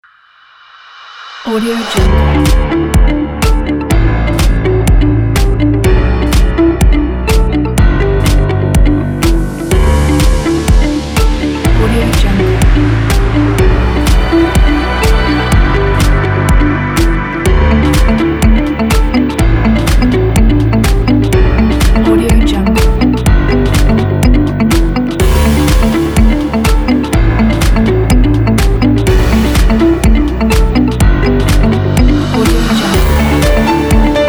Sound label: happy day